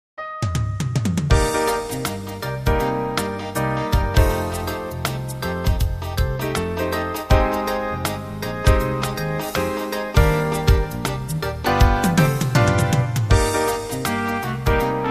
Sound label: reggae euphonia